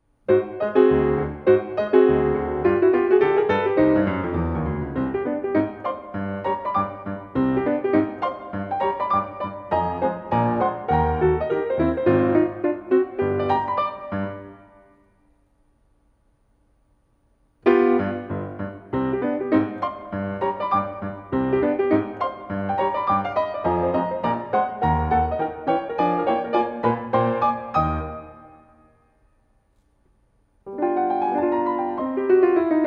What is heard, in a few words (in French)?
piano